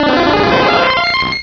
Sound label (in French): Cri; 1,4 s; cris 4G